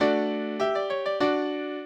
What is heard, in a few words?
minuet, mozart